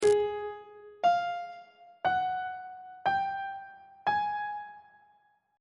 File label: g sharp 7th interval pitches on treble clef